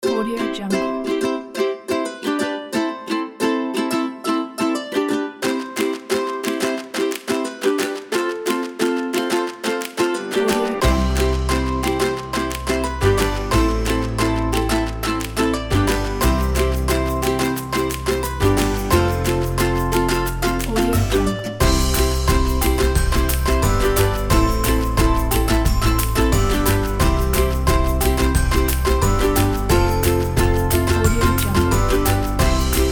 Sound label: summer ukulele